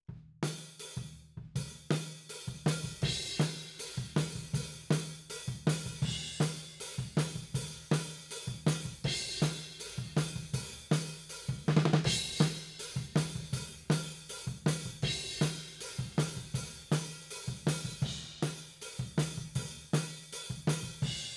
Tom, 160 BPM

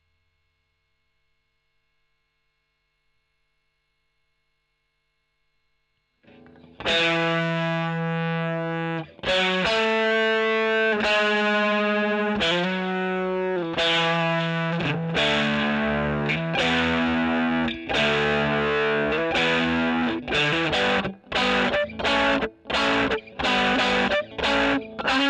E.GTR OD; Gefell

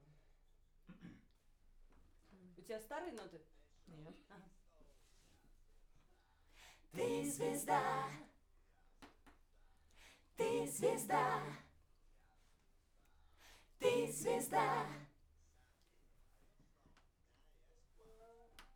Backs; SCHOEPS

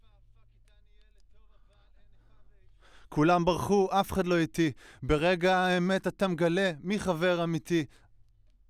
Voc

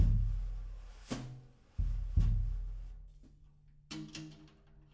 Room

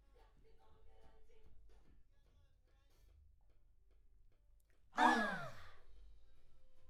Backs AKG LEFT

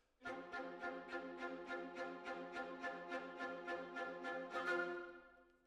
Decca, Jazz for piano

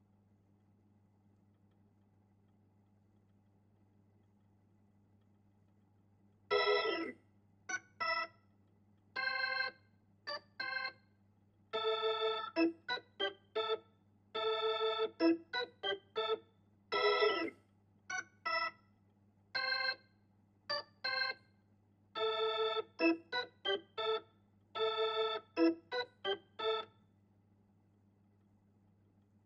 Hammond

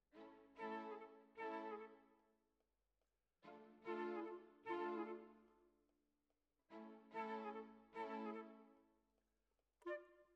Flute

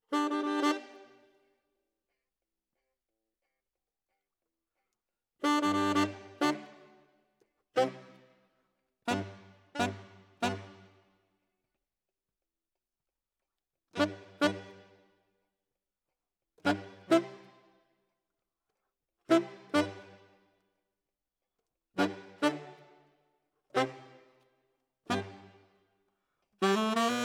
Tenor, RNR for piano

